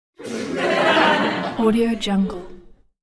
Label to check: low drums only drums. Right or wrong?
Wrong